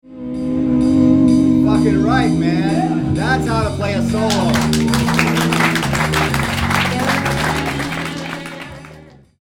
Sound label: Solo